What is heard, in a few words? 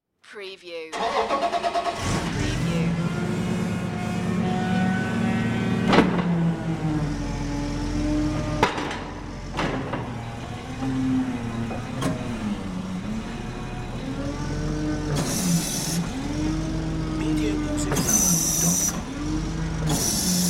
Hi Lo Start Drive Away